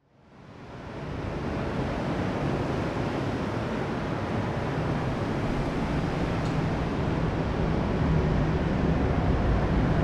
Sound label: Ambience; Ferea; Feria